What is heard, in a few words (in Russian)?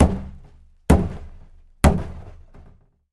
zvuki bochki